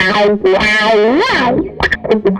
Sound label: Tele Guitar Loop